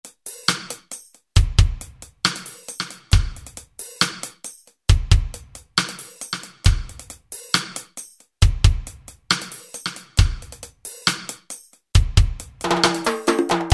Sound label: Reggae, Alesis SR-16; Preset Patterns